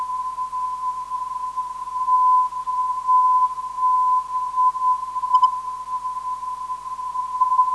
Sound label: 395kHz, ndb